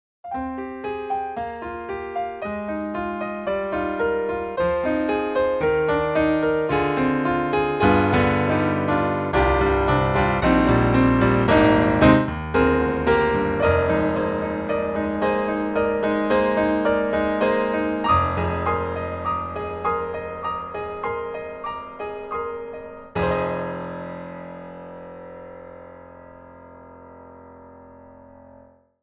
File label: grand, piano; BD Player